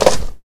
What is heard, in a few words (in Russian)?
Подобрал оружие